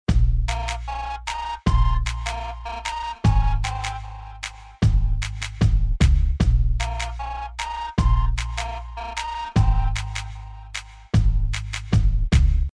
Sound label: Ad Music; Toyota